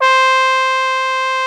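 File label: trombone C4; Greytsounds VOL-1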